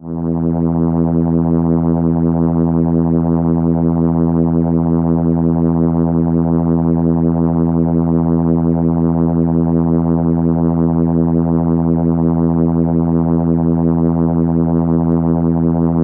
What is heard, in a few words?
Cello E3, ARP Solina